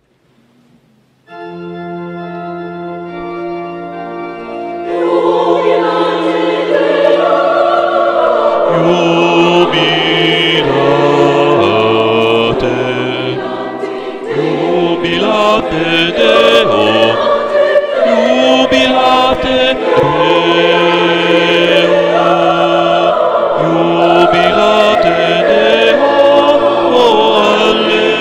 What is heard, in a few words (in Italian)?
Jubilate Deo mottetto Coro SATB Organo; BAS